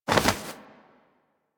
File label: Bird Of Prey Wing Flap Up; Warframe